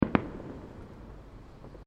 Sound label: Fireworks Multiple Firecrackers